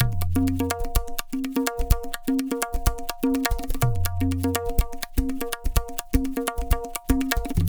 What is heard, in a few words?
Tabla, 125 BPM; Zero-G; Pure Tabla